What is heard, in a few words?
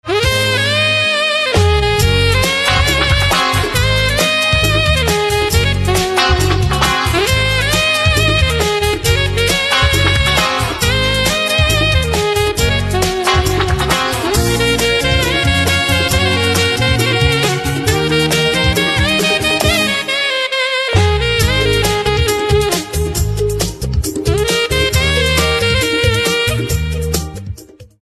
Saxophone Instrumental Ringtone